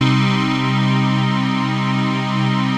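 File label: chord pad, Pads; Best Service; XX Large - Pads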